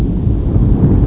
rumble; dosgames; roc

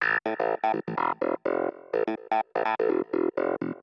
SYNTH HOUSE 125 A; eJay; Keys

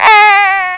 Shutdown; JetFrog 3.0.4; You Die

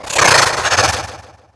triton attack; DAoC

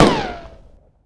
gun; Phoenix

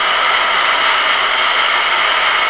SCRAPE; mscart

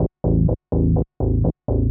SNTH BASS HOUSE 125 A; eJay

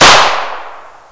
piano

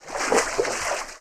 FS Water; kult

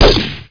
M16 crack; specops

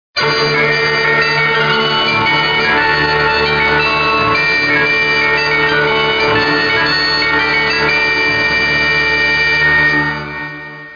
Cornamus

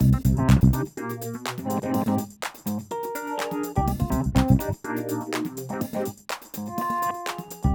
Acid Funk Breakdown

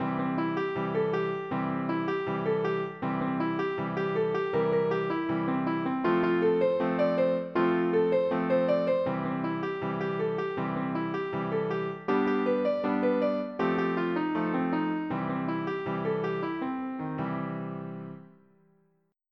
50S ROCK

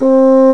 Bassoon C4; Indiana Jones Demo; iMuse Setups

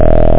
ACID SYN; SYNTHIS; HARDCORE

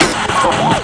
tuushin shot; VirtuaTennis

